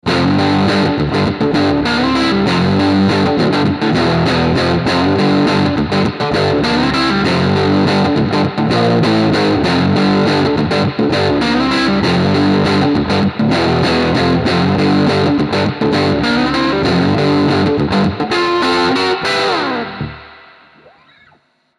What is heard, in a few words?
ESP Phoenix 401 Bridge OD